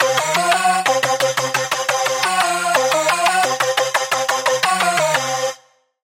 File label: Lead, Serum 2; Bloody Top; Routed Sound; Serum 2 Expansion - Chaos Bringer Vol.1